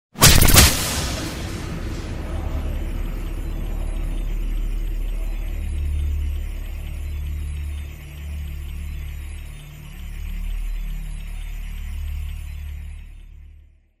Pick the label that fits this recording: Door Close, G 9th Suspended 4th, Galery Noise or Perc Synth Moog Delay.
Galery Noise